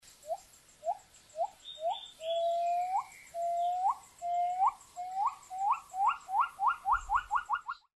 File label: Gibbon